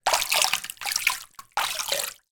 household bathroom basin water movement; zapsplat

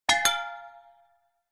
impacts glass hit ping clink reverb; zapsplat

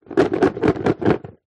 puzzle card box shake

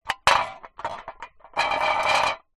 drink can empty movement roll concrete ground

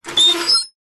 industrial lever pull heavy screech

